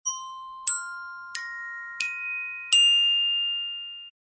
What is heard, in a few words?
orchestra bells rising notes